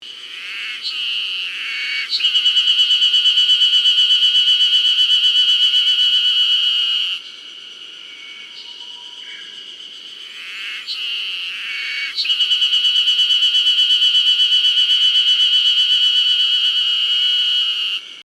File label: cicada ezoharu